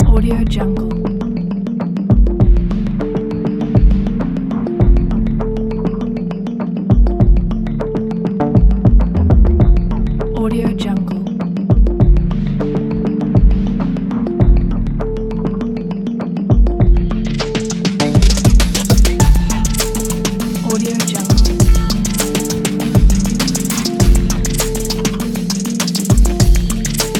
hiphop glitch

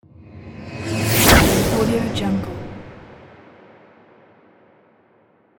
flash

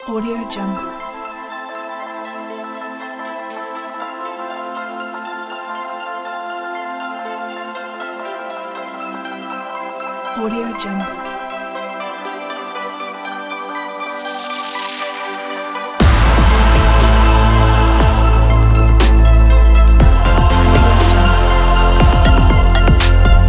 hybrid power intro

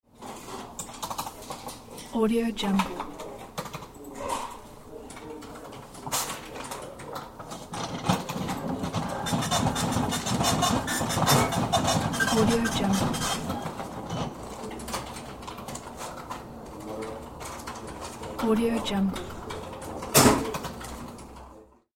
bank office ambience

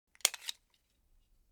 springfield 1911 45 mag out; soundmorph

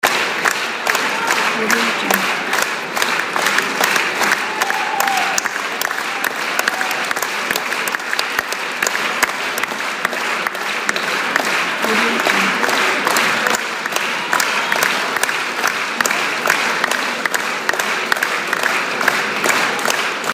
applause ovation

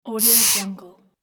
rat squeak

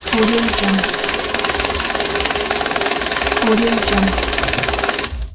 cash counting machine

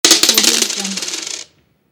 falling coins; flyingheadz

